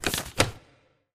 tile flip; newsroom

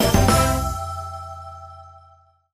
gameshow chip major

